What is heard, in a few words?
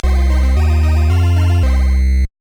Lost Race; Micro Machines